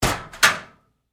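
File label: Door Locker Open Close Up Light, Metal Door; Doors; Big Room Sound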